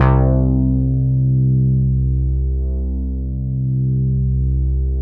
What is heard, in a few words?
MEMORYMOOG C2; Big Fish Audio - First Call Vintage Keyboards